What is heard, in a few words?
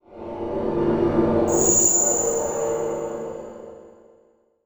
Production Element Title Transition Demonic